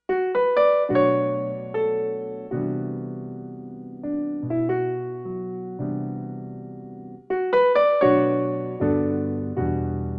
b minor blues

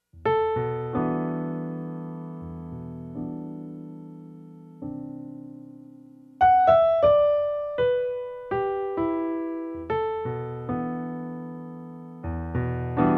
e minor blues

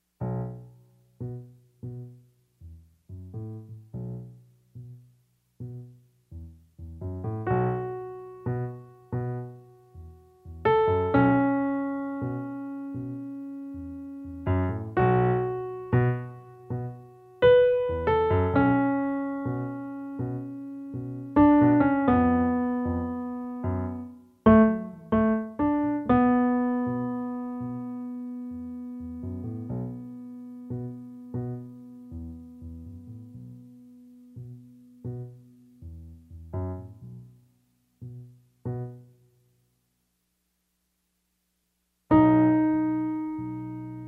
low spark duet

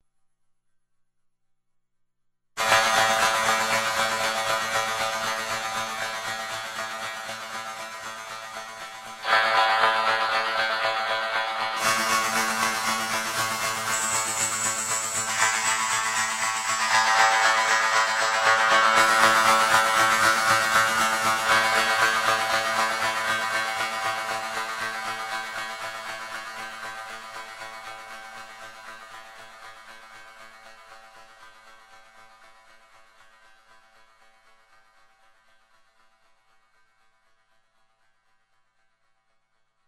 sitar shaker

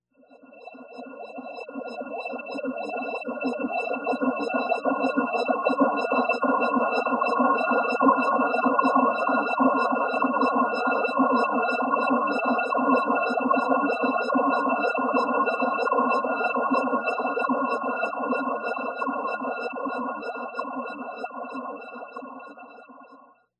entrance

